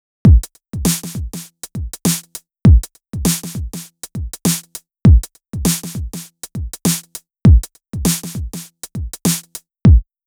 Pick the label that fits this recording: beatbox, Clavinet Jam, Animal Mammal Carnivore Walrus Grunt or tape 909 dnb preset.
tape 909 dnb preset